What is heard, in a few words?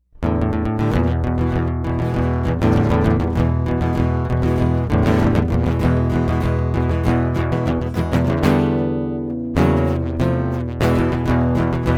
acoustic; MegaEpix